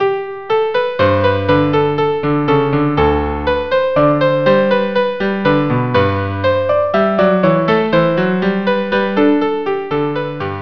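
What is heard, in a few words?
violin cello duo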